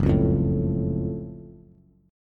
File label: Gb Minor Major 7th Gb Bass; strummed; 2,4,3,2; JGuitar